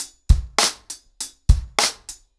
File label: 100BPM, ACOUSTIC DRUMS; The Roots Drumloops